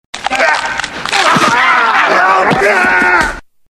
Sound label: aaagaah; svencoop; FZSaySound